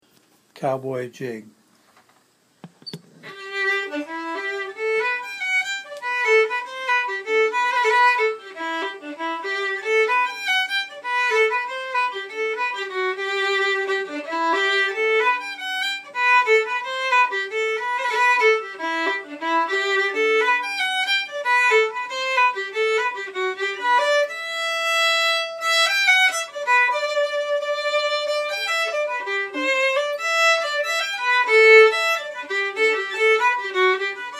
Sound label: Cowboy Jig